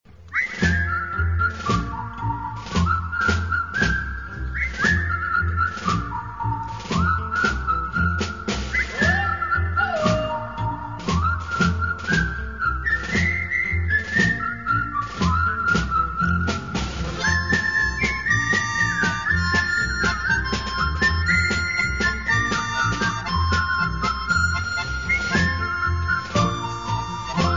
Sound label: Whistle March; Company Q